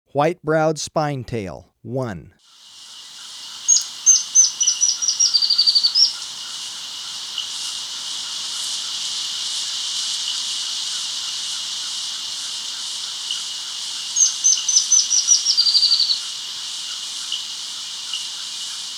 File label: White-Browed Spinetail; S. America